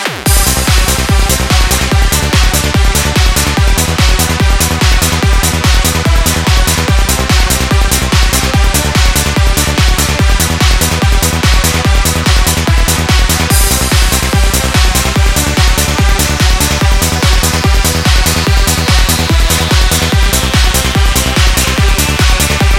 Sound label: trance remix